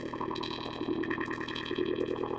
chopped velocity texture; synth; instruments; loops

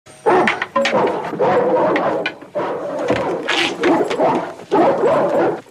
DOG BARKING AND WOOD CLANKING

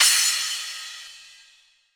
crash one shot f key

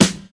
original steel snare drum sound g key

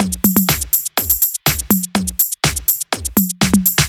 drum machine roll and fill tech house; drum loops

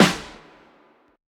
big acoustic snare sound f sharp key

